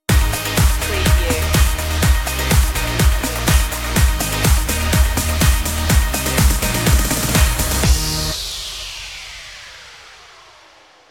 beach dance stinger

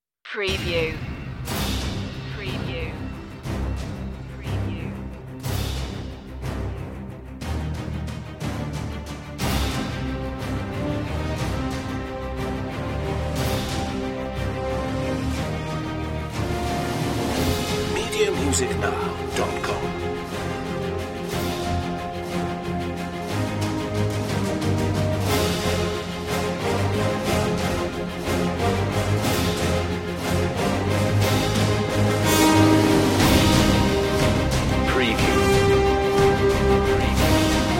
no choir solo voc

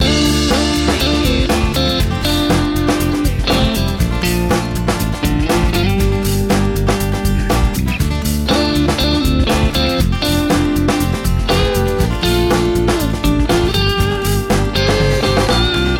acoustic uptempo travel song loop

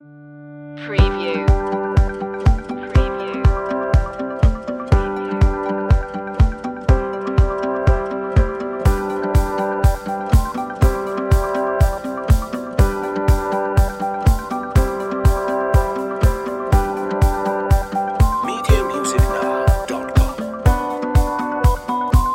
hopeful

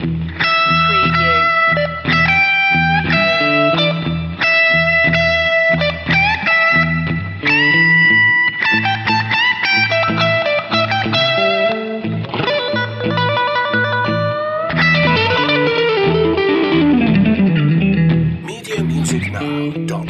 slow blues loop

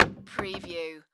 Cabinet Door Close

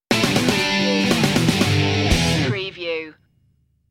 rock sting

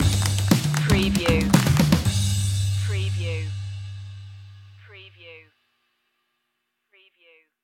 morning motivation stinger

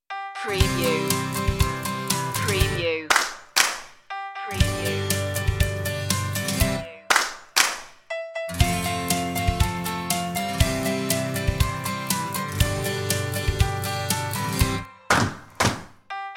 instr

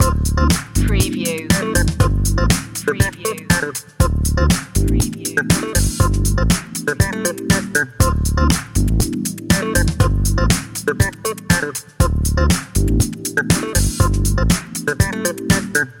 funk loop

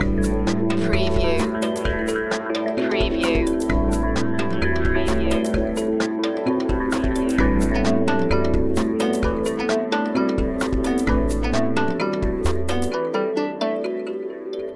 trade routes loop

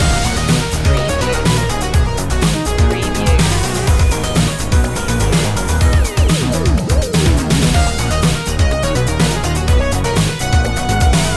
industrial zone long looping

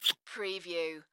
Kiss Smooch Female; Sound Effects Bible

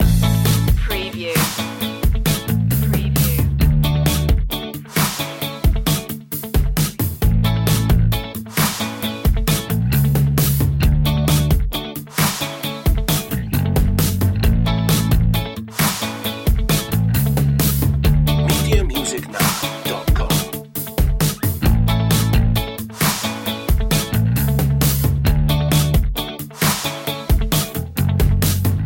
french indie loop

